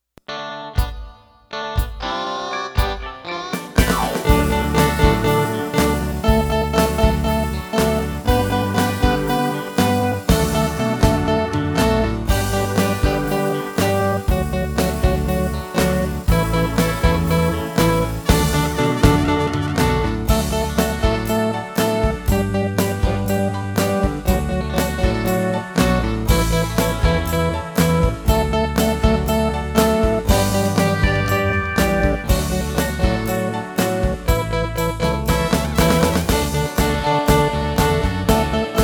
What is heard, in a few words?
Twinkle Variation, String Songs